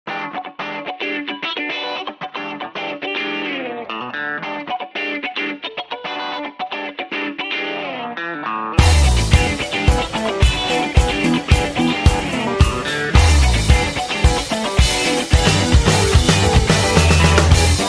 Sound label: zouk